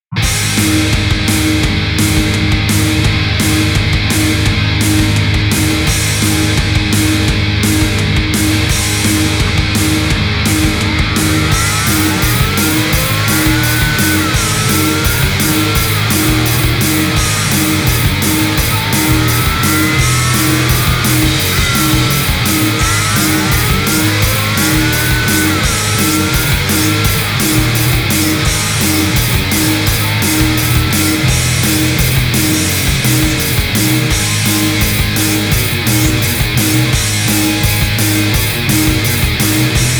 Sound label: instrumental